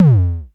KLONE XLNT KICK, Klone Dual-Percussion-Synthesiser; Drum Machines